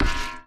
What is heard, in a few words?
Brassy Impact